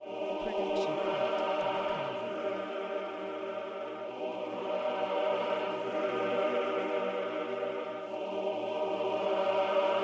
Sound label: epic choir men; soundscrate